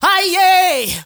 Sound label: MALE SOLO, Heart of Africa